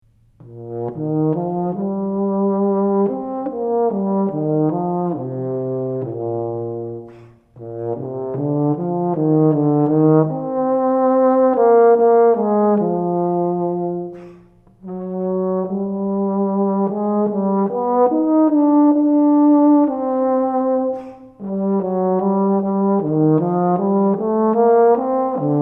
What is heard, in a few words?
Euphonium Middle School